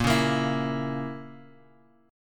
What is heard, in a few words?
A sharp Diminished Guitar Open F, chord; strummed; JGuitar